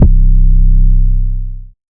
Motor City 808 - C; Drum Samples; Cymatics; PHARAOH

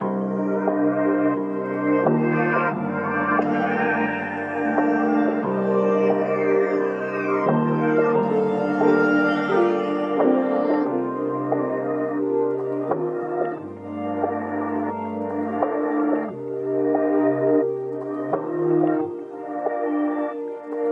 177 BPM G Min, Melody Loops RnB; Grey Skies

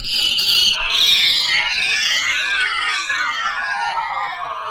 Overhanging Downlifter, Dubstep; Ghosthack - AC2024 - Day 13 - Dubstep Sounds 2025; Effects

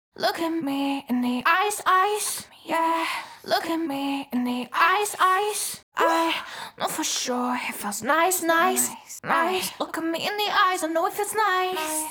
CLEAN ACAPELLA (EMaj) BPM145, Pop Vocals; Ghosthack; Ultimate Pop Vocals

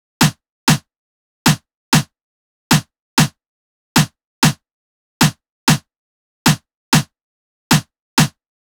Drum Loop - 96 BPM Snare, Latin; Cymatics; PARADOX II; Drum Loop Collection; Campari

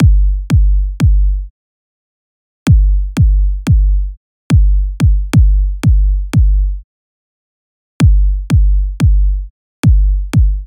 Trap 90 Kick; Drum Construction Kits; Loops; Ghosthack; Drum Mega Bundle Demo; Drum Hero 5; Trap 1

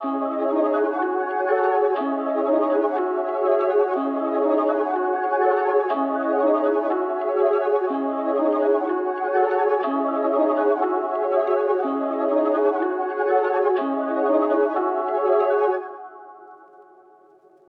122 BPM C# Min Main Melody, Loop Stems; Melodies; Cymatics; 2022 Melody Mystery Expansion; Chain

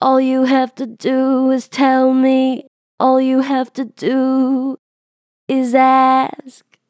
Strong Vibrato, Natural Vibrato Strong, Vocals; All you have to do; Harmor Vocals

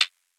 Lofi Closed Hihat; Cymatics; Lofi Starter Pack

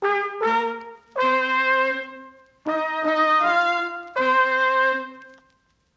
Classic Melody Loop 80 BPM G# Maj Brass; Melodics; Loops; Loop Stems; Cymatics; Eternity

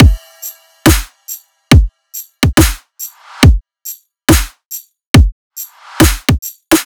Riddim Drum Loop 140 Full; Ghosthack; Riddim Essentials Volume 2

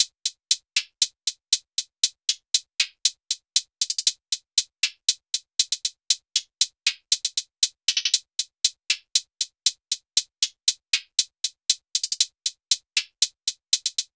Hihat Loop - 118 BPM, Hip Hop; Lemonade

